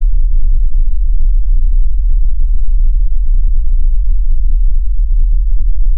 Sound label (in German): schwarzes rauschen